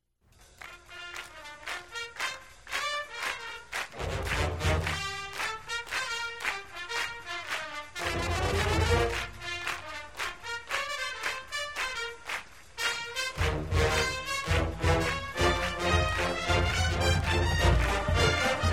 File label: Band; UGA Redcoat Band; Glory Glory Dixieland